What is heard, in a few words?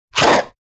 Wet Mouth Hit; Vintage Cartoon; Comedy Vocals